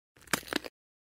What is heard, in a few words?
fights